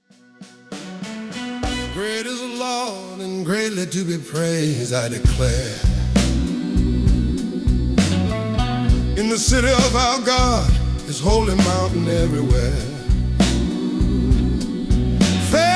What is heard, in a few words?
Southern Blues; Psalm 48 Glory of Zion